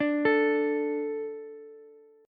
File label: D 5th D, down, Ukulele; D5 arpeggiated; JGuitar